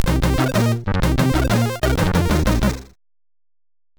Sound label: atmosphere; SIDTunes